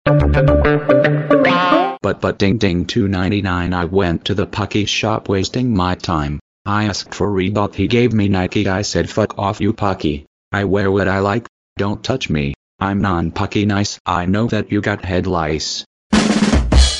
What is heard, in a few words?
rap